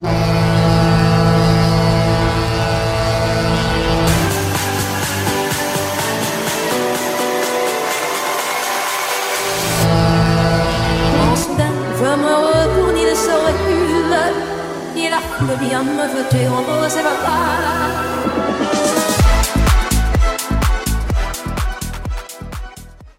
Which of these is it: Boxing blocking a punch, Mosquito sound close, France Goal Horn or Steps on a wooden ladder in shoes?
France Goal Horn